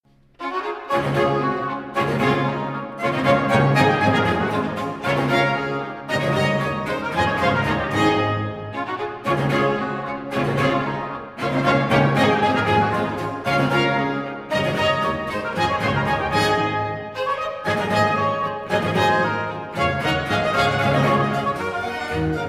Orchestral Suite No. 3 in D Major BWV 1068 V. Bourrée